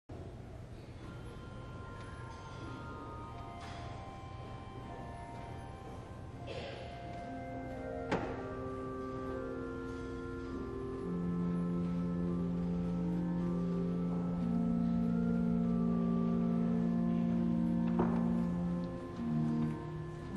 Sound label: Passing of the Torah Improvisation, organ